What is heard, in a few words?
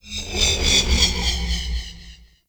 Ship Distant Energy Pulse; Warframe; Corpus Ship Remaster